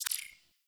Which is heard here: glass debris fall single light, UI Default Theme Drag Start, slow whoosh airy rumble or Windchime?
UI Default Theme Drag Start